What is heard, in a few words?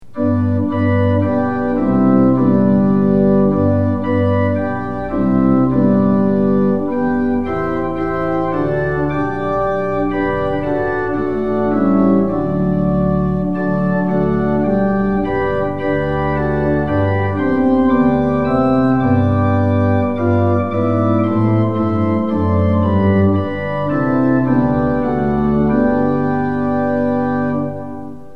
tune; hymns; lillenas